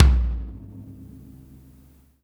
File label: Kick Shard; ZeroGravity Drum Shards